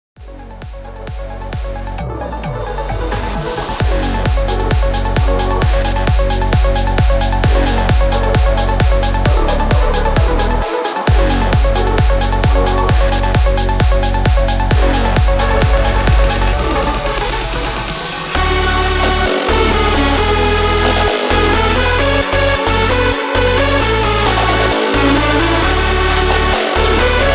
132 BPM

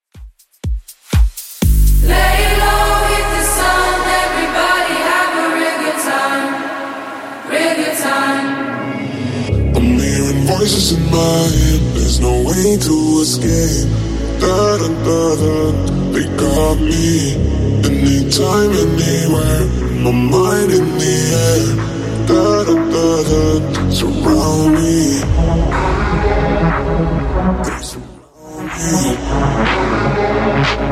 Top40 Redrum 122bpm

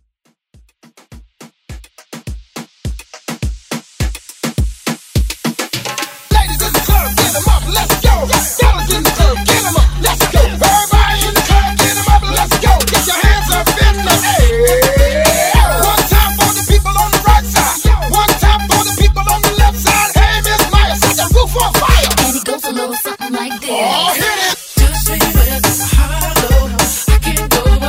Moombah Hype

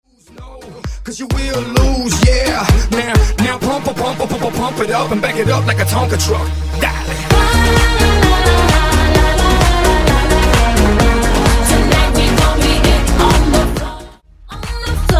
Funk Wordplay 130 Bpm

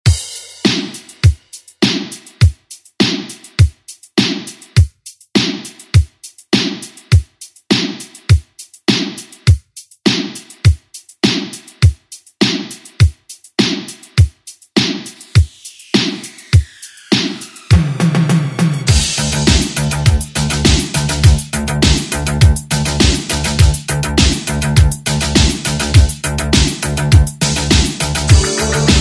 80s Re Drum; DJ Mhark

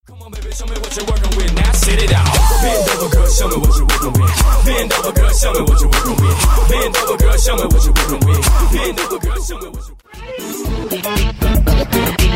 Throwback Pop RNB Megamix

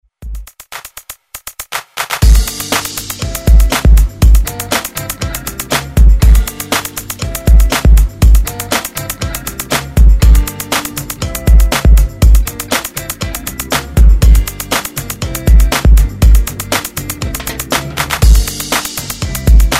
70s

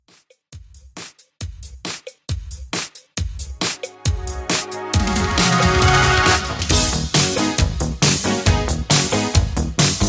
80s Extended Classic 136Bpm

